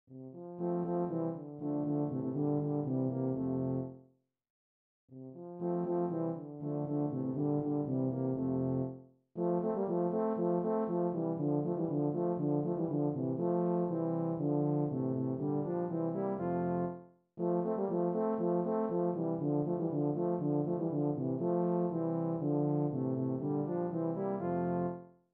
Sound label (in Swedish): F-dur